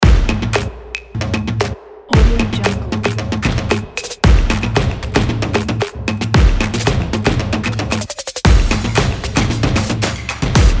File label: sport intro music kit